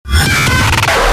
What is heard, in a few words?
hit complex short